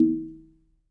SLIT, ETHNIC DRUMS; Spectrasonics; Liquid Grooves 1 & 2

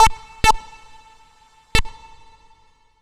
MR SYN 1 L, Roots Funk; USB Soundscan vol.41; AKAI

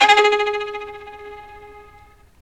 STR Violin, Solo Violin FX

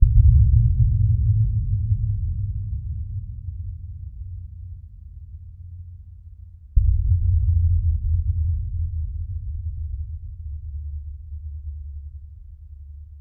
Steel Sheet Thud; Sonic Foundry (Sony Creative Software) - Ambient Atmospheres & Rhythms; Ambient-Metals

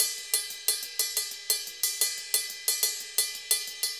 Ride, Festa Latina, Drums & Percussion; Best Service; Festa Latina VOL-1

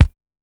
Kick Mid, classic machines dx

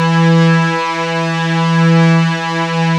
OMNI REEDS, ARP Synths; E-MU Formula 4000 Series Vol. 3; Analog Odyssey; ARP Synths II

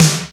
R8 Snare, Roland R-8

